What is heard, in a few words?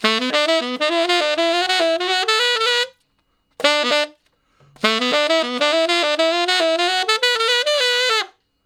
Ten Sax Straight (B), R&B; Solos